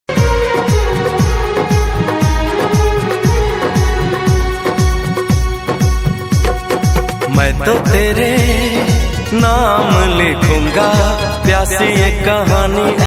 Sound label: Hindi Romantic Love story music; Tik tok music